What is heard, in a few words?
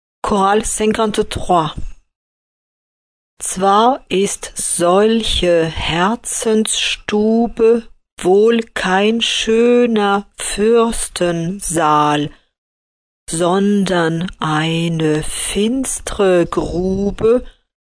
choral; partitions chorales